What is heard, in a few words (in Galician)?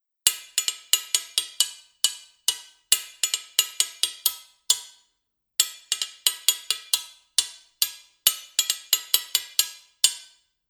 fouce